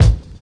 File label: prominent kickdrum sample g sharp key, Royality free kick sound tuned to the G# note